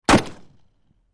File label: gun